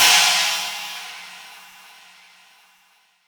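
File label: China cymbal, Boss DR660; drum machine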